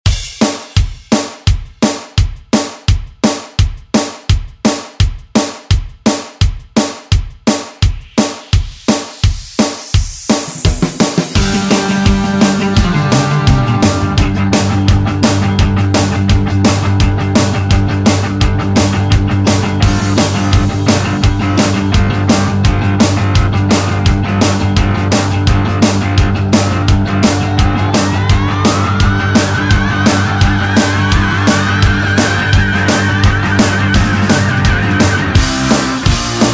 70s Re Drum; DJ Mhark

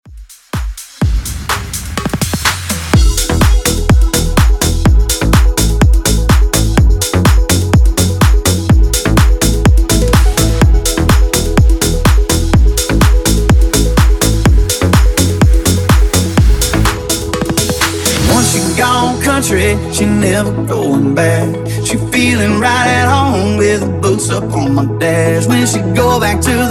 country future house breaker 125 bpm

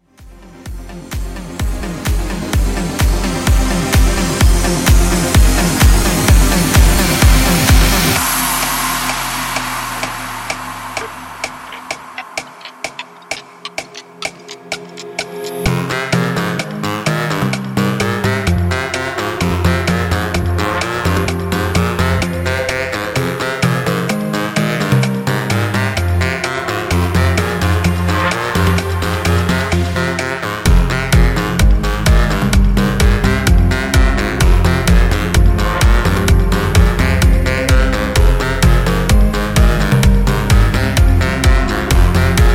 Rattle Epic Mashup 128Bpm

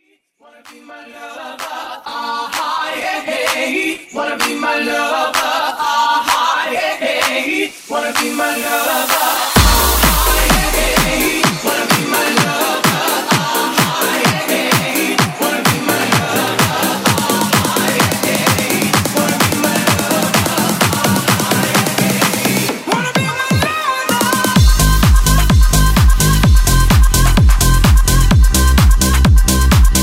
Acapella Intro

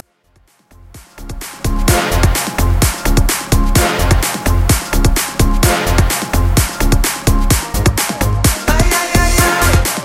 Latin Mainstream Mix 128 Bpm; Bootleg; Vegas Edit

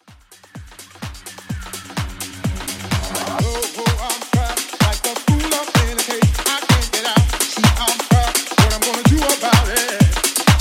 80s Deep House 127Bpm

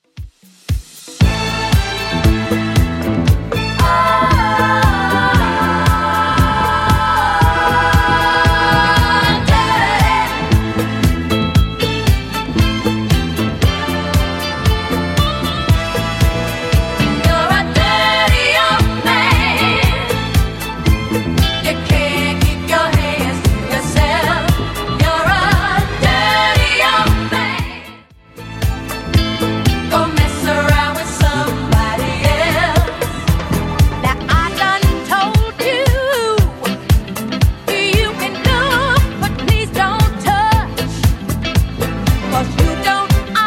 70s Redrum 116bpm

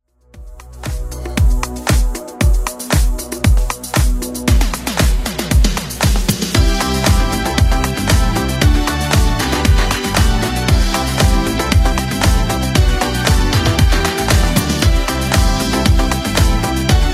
80s Club 116 bpm

